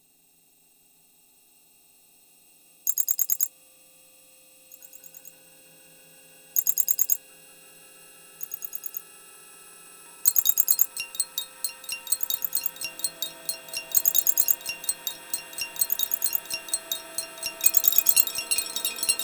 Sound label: noise; Thuoom; noisebtles